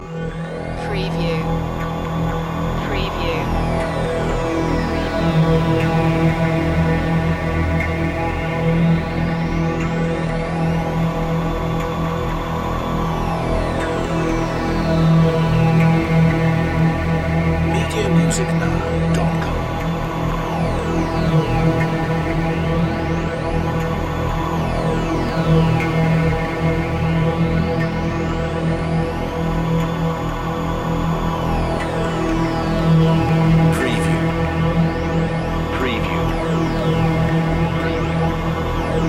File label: filter sweeper